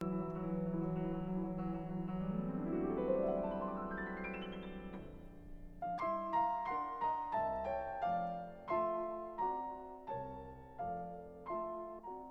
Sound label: Piano Concerto No 3 in C Major Andante meditativo; Yefim Bronfman; Variation 4